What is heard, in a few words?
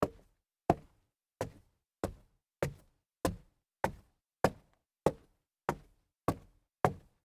walk up stairs wood